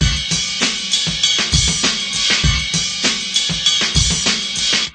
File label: 98 bpm cymbalic, drum loops and breaks; 091 to 100 bpm